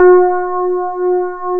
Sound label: RHDS B4, FM PIANOS